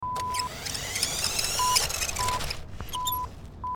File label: Remote Control Car